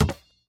Basketball Pass; Doodle Jump 2

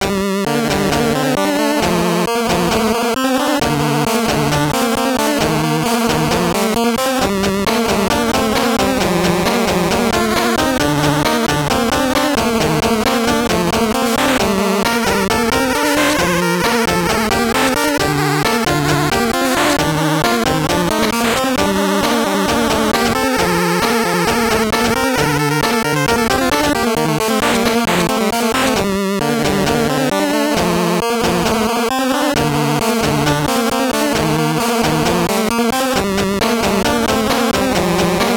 BGM; mummy returns; 2001 gbc

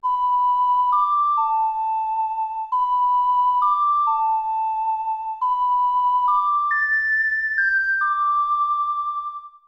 Ocarina, Lullaby

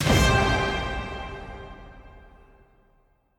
Mus Multi Jingle

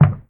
Wood soft; devour 2021 gamerip pc macos

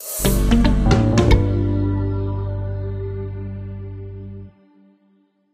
Stinger World Map

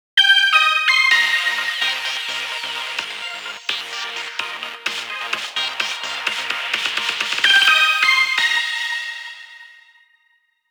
Radio Jingle (Noon); Animal Crossing New Horizons

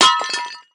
Pot Break Large; Angry Birds Rio

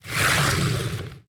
Whoosh; portal quest; android; Mass Destruction Skill1

